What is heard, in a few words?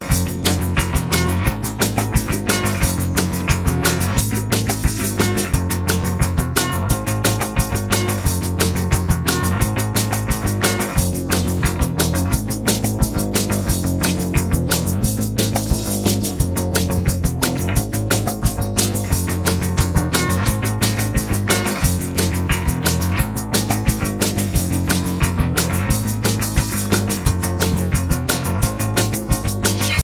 instrumental